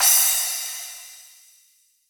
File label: SPLASH, HR-16 Drum Mashines; EdgeSounds